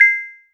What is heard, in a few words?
Clave